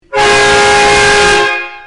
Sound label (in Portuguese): Buzina 4 canos